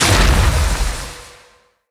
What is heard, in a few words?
Lightning Slam Impact; Characters; Enemy; Abilities; Sinecure; Banneret